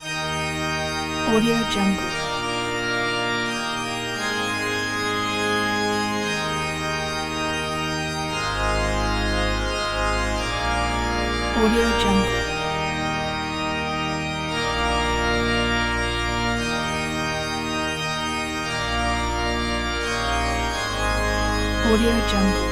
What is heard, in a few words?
church organ